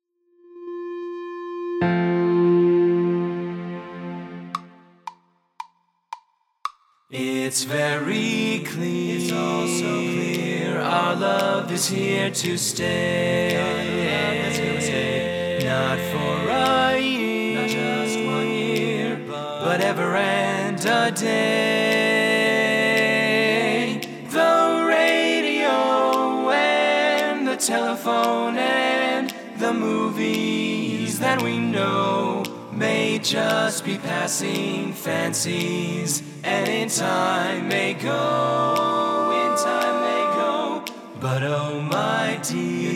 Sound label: - Lead